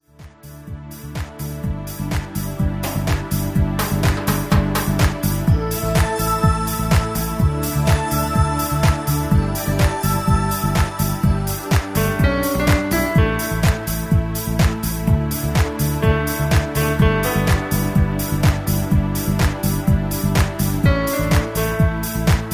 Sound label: Rich Piano Patter